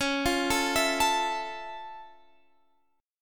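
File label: F Augmented Major 7th D flat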